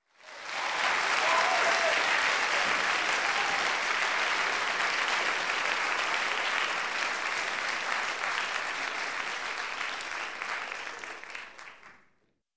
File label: Talk Show Audience Applause